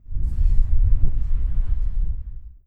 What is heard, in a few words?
wind